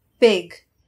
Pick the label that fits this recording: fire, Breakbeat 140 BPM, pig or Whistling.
pig